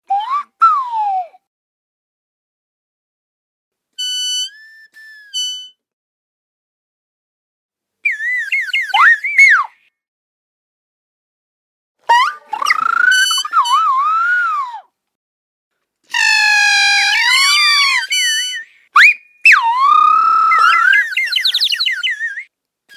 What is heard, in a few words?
birds